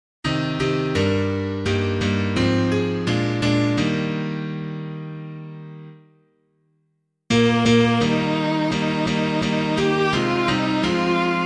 As the Birds of the Air, piano; MIDDLEBURY

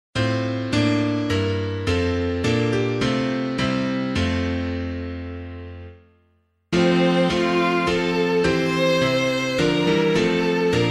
piano

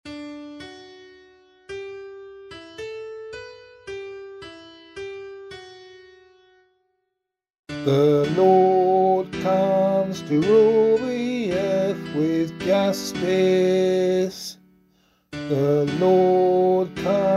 Psalm C vocal

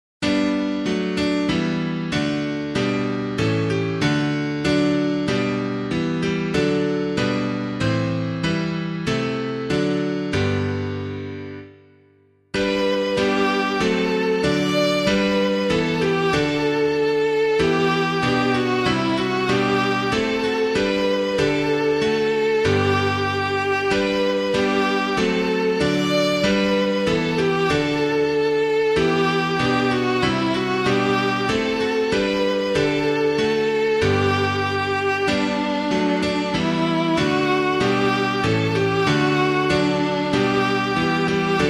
At the Supper Christ the Lord, piano; Mowbray; LIEBSTER JESU

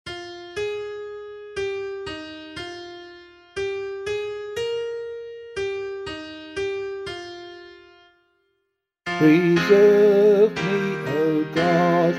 Psalm, vocal

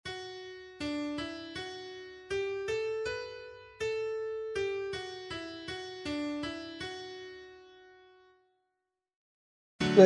Psalm C vocal

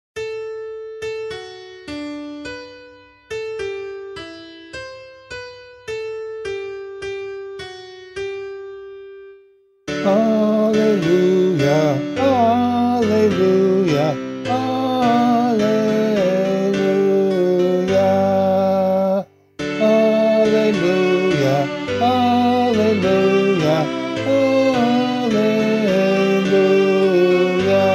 Holy Family Gospel C - vocal, Gospel Acclamation

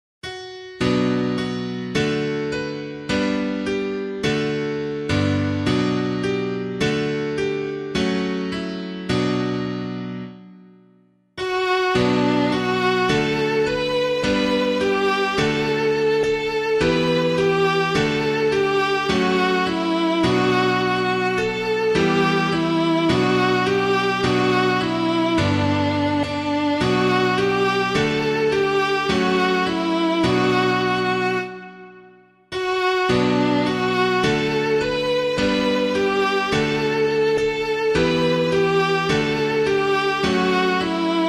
piano, hymn; Creator of the Stars of Night; Udulutsch; CONDITOR ALME SIDERUM

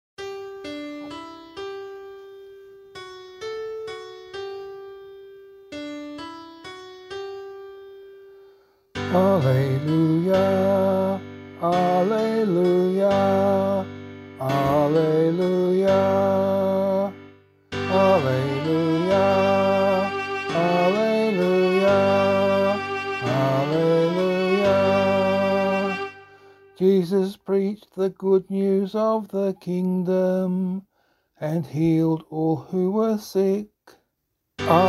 Gospel, vocal

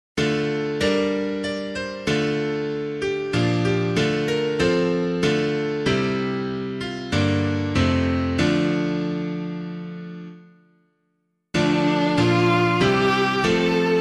Forgive Our Sins as We Forgive, piano; Herklots; DETROIT

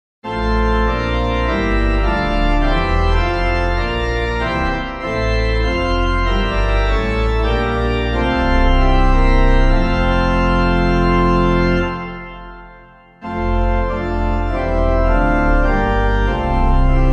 organ

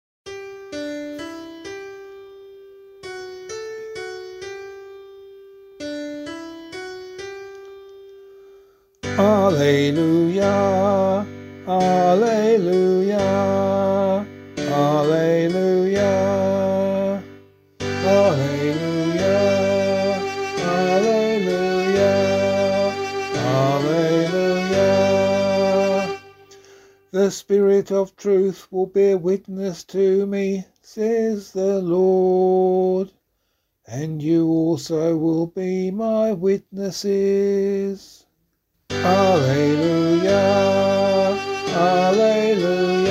Gospel, vocal

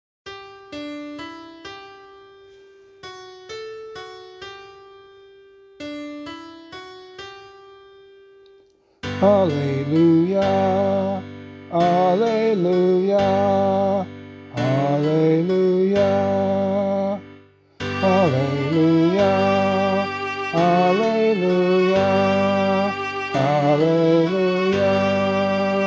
Gospel, vocal

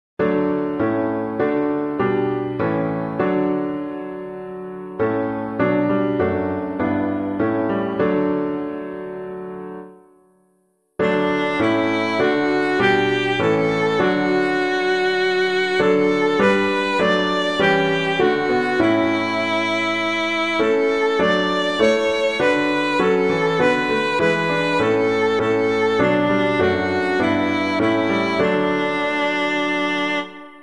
piano; 'Tis Good Lord to Be Here; Robinson; FRANCONIA

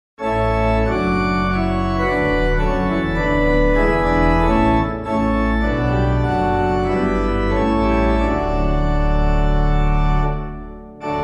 organ, hymn; 'Tis Good Lord to Be Here; Robinson; FRANCONIA; Clyde McLennan

